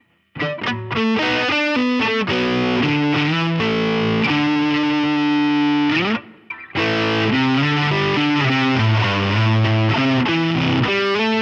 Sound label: Lead, rebelliant neck